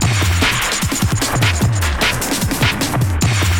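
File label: drum loop; X-Static Goldmine 3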